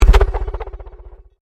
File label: Convolution Pulse